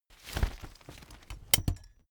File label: umbrella big open full